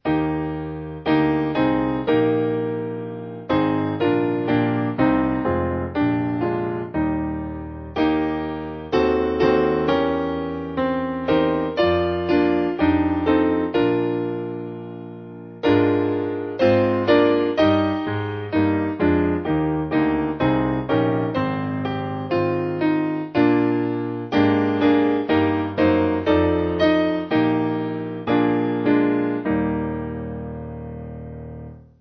O Lord Of Every Shining, Piano; Strength

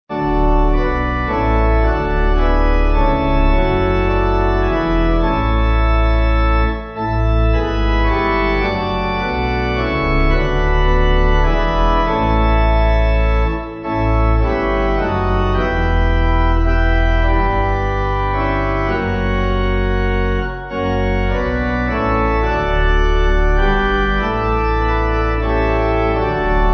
Pipe; Forth In The Peace; Angelus

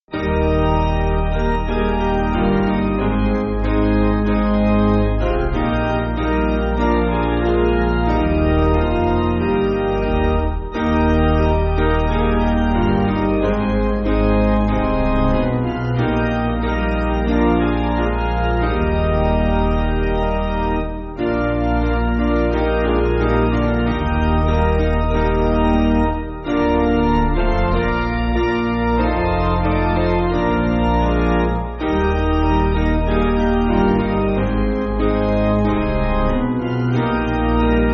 Hymnary Piano

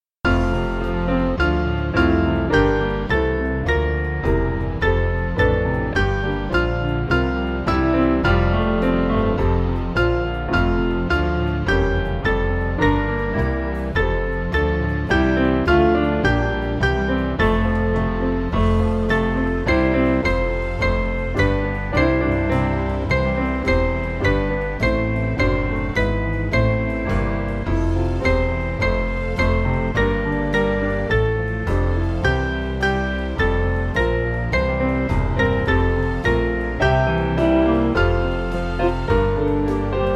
Piano, church music; Hail To The Lords; Freut Euch

